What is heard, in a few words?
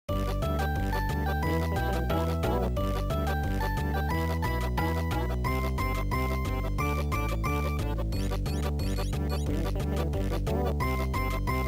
laugh! be happy!, music; mother 3